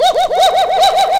Whoo Vox; Zero-G Jungle Warfare 1, 2 & 3; 90 s Sample CDs

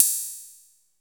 HI HAT, DRUMS; Music Store Sound Service; Einstein

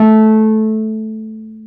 PNO T.Wurly A 4; Keyboards of The 60's and 70's

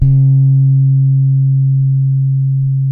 SAMBA, Bass; Spectrasonics; Bass Legends; TYLER SAMBA

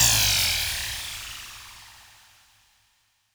Revo Down; Roland SPD-S

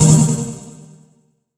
C2, Synth Leads

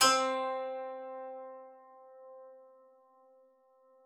klavicymb C4; Xsample VOL-8

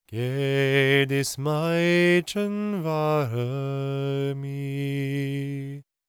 GAELIC, World Voices; ILIO; Vocal Planet

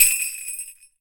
SUZU, JAPAN PERC; AKAI S6000 CD-ROM; WorldSounds

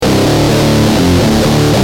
Guitar, Industrial

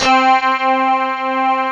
ROCK GUIT C4, ROCGUITAR

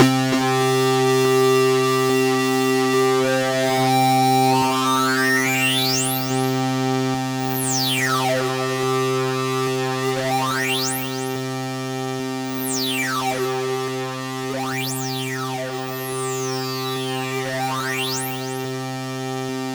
C3, SynthLine

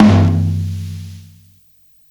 Floor tom, Psychedelic Drums

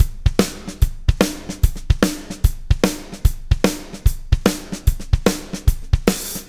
POWR, Backbeat, SHUF

